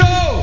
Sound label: DEATH; jetsetwilly3